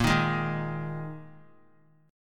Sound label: Bb 7th Suspended 4th Bb 3C,3F,3A,3D; strummed; JGuitar